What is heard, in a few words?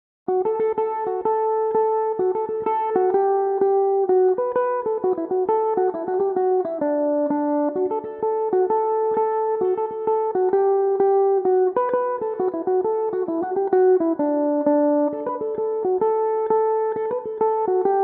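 D major, irish mandolin, tunes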